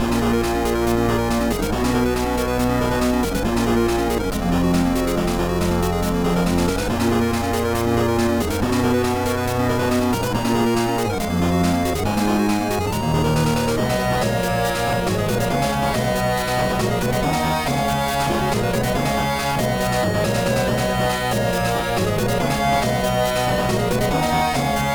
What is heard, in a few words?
BGM; Reign Of Fire; gba